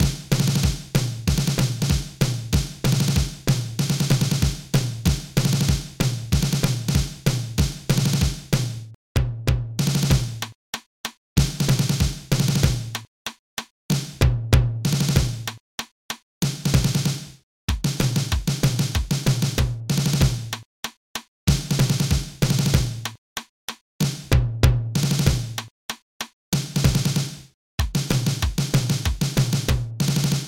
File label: drum salute